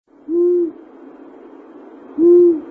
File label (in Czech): Asio otus, Samec se ozývá poměrně tichých, opakujícím se „hú-hú-hú...“; Mapování rozšíření sov na východním Bítešsku